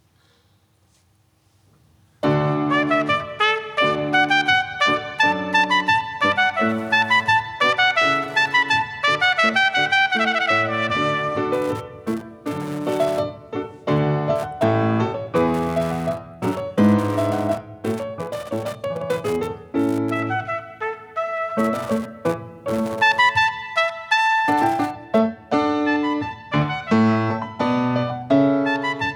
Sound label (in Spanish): Sonata para trompeta y piano en Re mayor; Henry Purcell; Z.850; mov.I